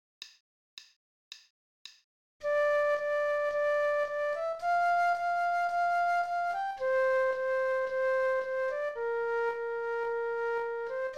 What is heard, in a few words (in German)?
Floete, 110; Floete 4